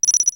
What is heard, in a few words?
GUIRO; AMG - Vince Clarke Lucky Bastard